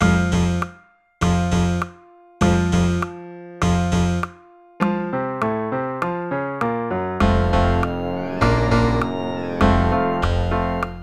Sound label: range bass; bass trombone; Range Stretch; Tempo = 100; First Habits Warm-Up